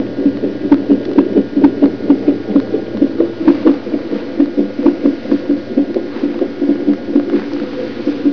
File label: fetal heartbeat